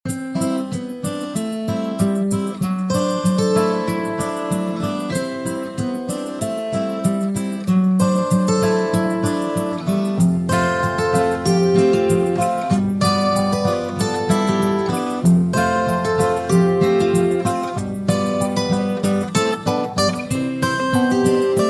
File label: acoustic guitar, music on hold